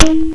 bing; Gravity Balls

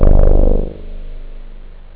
short, synth; OctaMED SoundStudio V1

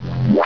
SWIPE

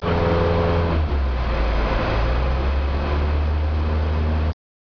stall; 10,000 Graphics Pack Volume 1; Media Graphics International

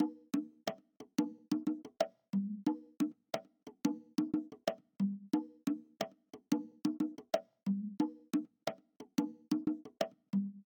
drum; bombogroove

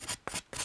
chalking; PC Gamer IT CD 45

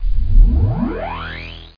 regen; mtgdemo

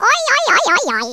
oooww; Swedish

